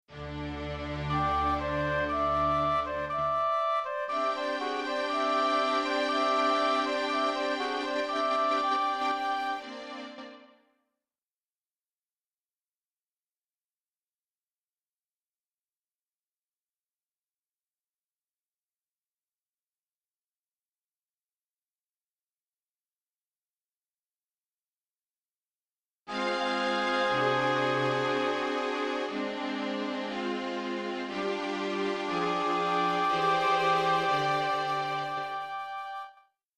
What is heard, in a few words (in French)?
hautbois flute